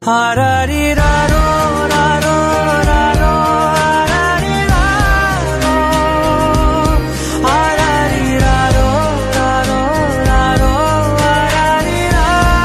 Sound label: Bgm Tamil Ringtone